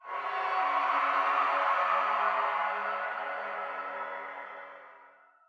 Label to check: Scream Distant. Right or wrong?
Right